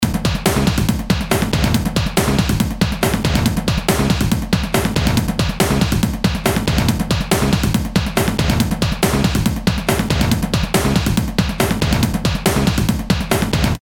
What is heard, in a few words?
All Kicks, Drum Loops; Industrial